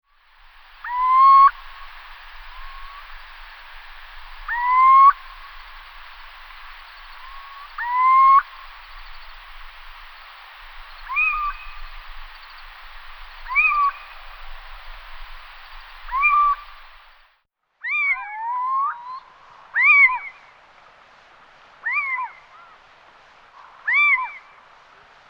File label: Athene noctua